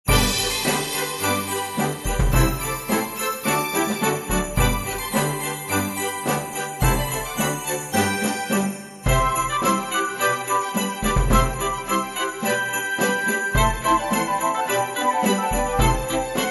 Procession With Drums

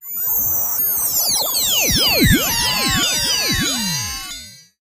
Warp Field

